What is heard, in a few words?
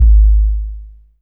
KICK, DRUM HITS; Dubstep; Loopmasters; The New Sound Of Dubstep